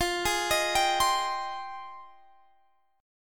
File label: F Minor 9th F